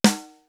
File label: PICCOLO; East West - Global Sounds; D4 BD SD TOM